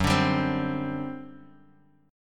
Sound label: G Major 7th Suspended 4th, Gb